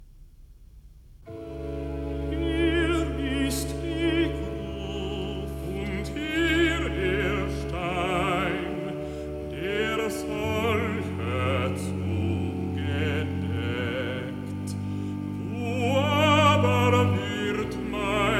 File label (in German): Rezitativ (Tenor, Baß, Alt); Bach Oster-Oratorium, BWV 249; Ludwigsburg 1968; VI. Rezitativ Hier ist die Gruft